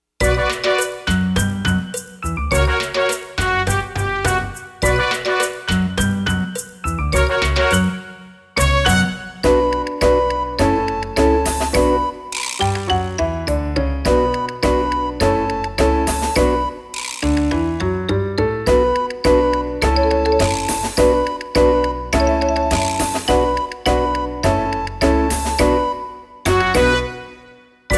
Two Note Tango

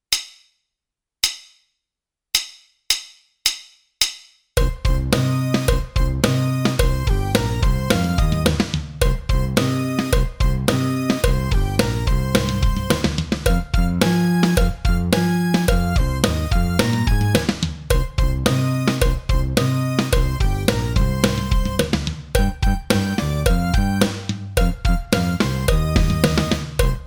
Jackhammer Blues Track

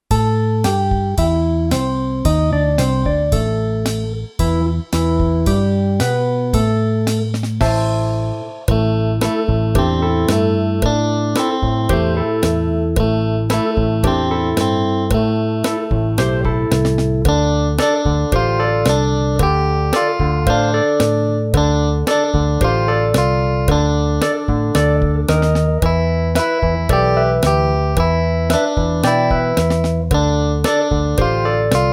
Three String Blues

